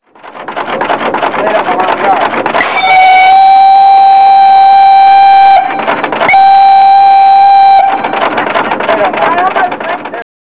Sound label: fast toots